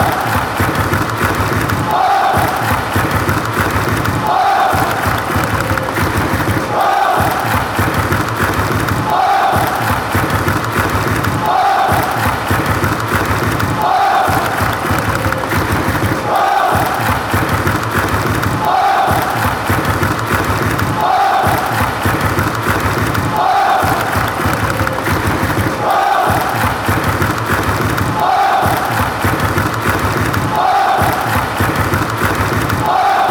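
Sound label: bayer rhythm, football songs and soccer chants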